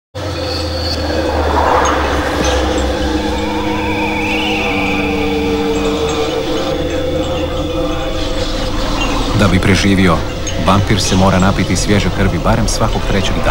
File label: vampire song